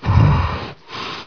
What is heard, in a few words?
scale gasp